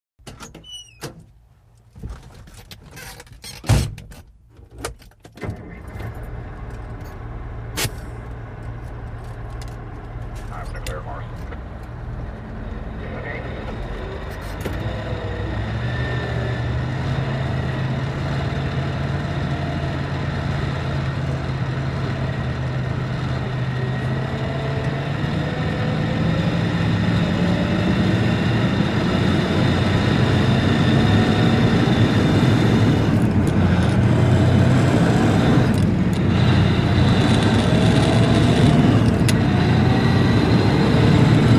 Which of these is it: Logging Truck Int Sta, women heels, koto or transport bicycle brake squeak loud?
Logging Truck Int Sta